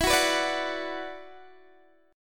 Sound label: E 7th Suspended 2nd, down, Guitar, Open D